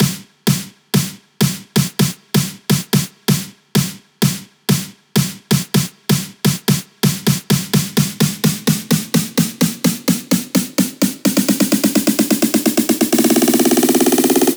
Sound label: Snare Roll